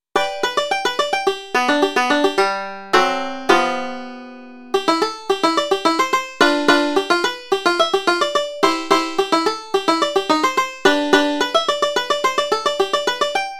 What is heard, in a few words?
Polka; Popinjay Polka